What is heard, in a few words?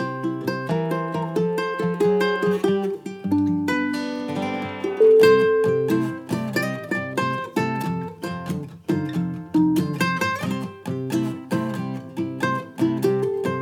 uke blues